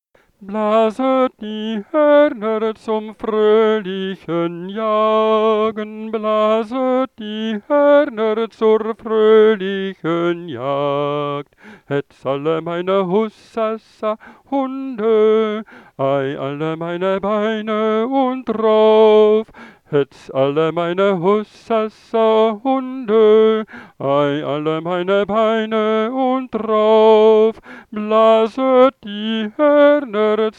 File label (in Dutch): herfstliederen blaset die horner zang eenstemmig